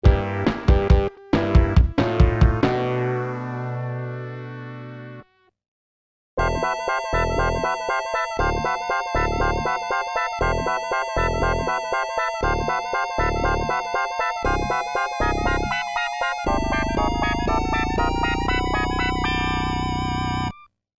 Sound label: Game Over continue; Gleylancer